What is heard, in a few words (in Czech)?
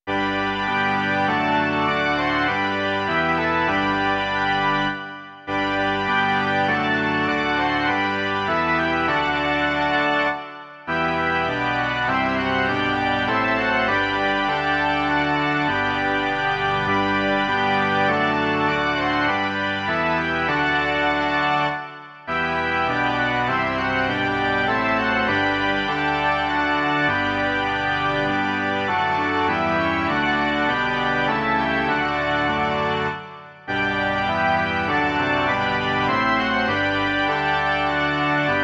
varhany; Přišli jsme, ó Ježíši